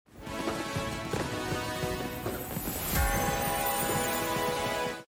Happy New Year Fireworks

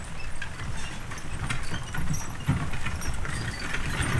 Horse Drawn Wagon; Electa Quinney

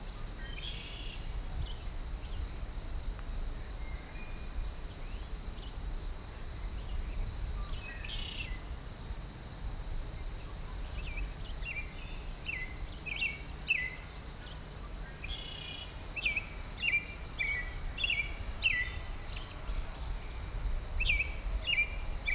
Meadow BG Birds Dist; Poetry Project

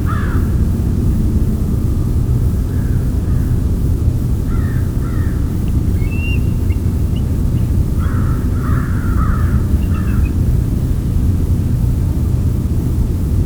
Crows & Eagle; Electa Quinney

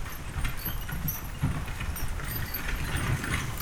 Horse Drawn Wagon By; Electa Quinney

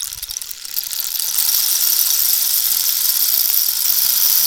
African Rain Stick Pou; Electa Quinney

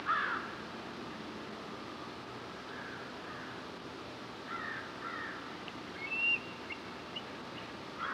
Crows & Eagle; Electa Quinney

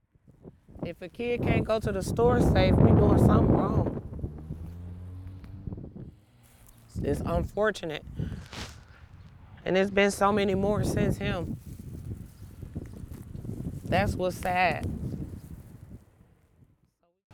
wind; Wisconsin Life; Peace Garden

